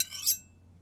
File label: Small Knife - Scrape, kitchen knives; Micro Pack